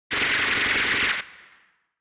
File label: Retro Anime Gun Short